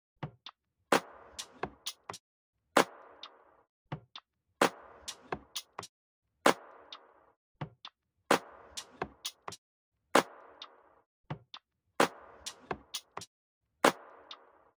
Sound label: Drum Loop 130 BPM Percussion; Loop Stems; Cymatics; DOPE DRUMS VOL. 1; Flavor Drum Loop